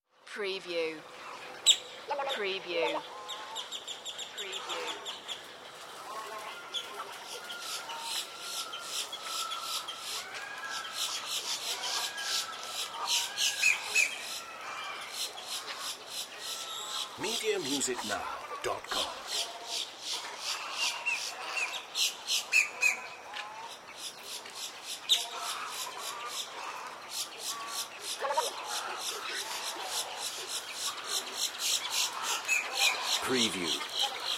Marsh Ambience Florida Evening Long

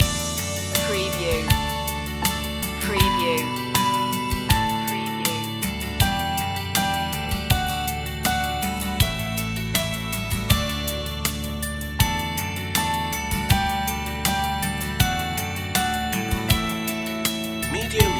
twinkle twinkle little star loop

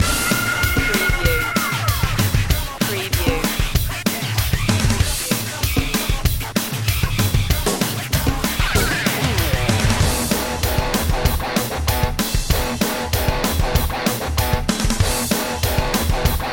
metal driver

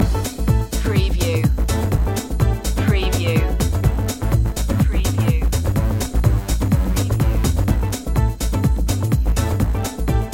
pulse line loop

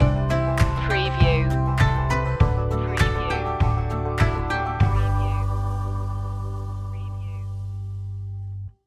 thatll do it stinger

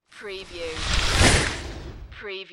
Skiing, Snowboarding, Downhill, slide by fast

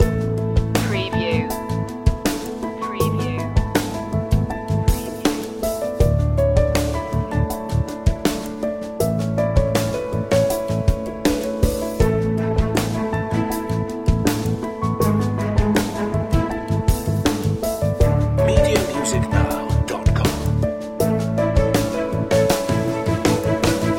building success loop

